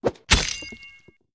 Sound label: Beheading Axe, whooshes & swishes